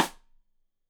Side Stick Stereo; Deeply Sampled Drums

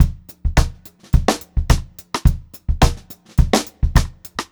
BEAT, Funk-Groove Drumloops; USB Soundscan vol.38; AKAI

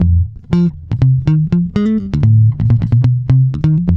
BOOG F, Bass, BOOGALOO; Spectrasonics; Bass Legends

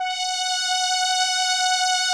SOLINA, String Machines

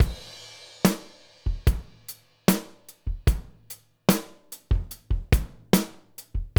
LOOP, Groove Activator; AMG Gota Yashiki

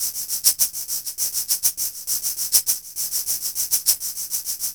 Shaker Echo; Ambient; Sonic Foundry (Sony Creative Software); Ambient Atmospheres & Rhythms

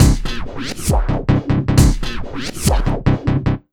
Big Beat Drumloops, LOW 135; AKAI

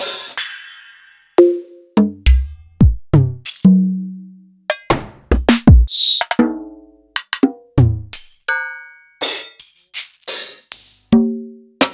EMU Orbit

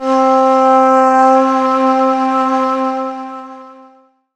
Ethnic Synths, Dance Synths

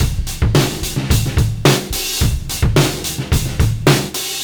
108, Beat Loops; Zero-G; Monster Beats

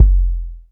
BD, Bassdrums, Roland TD-20

